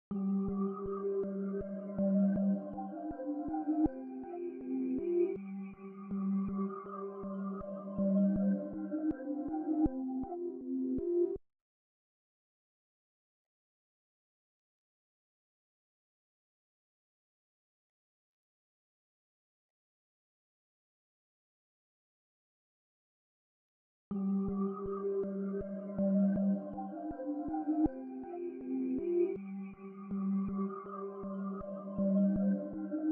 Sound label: 160 BPM G Min, RnB; Cymatics; 2022 Melody Collection - RnB Pt 1; Aroma; Weird Render